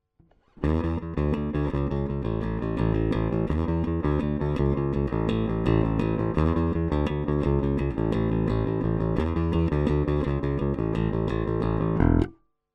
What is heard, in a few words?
E Bass Big Amp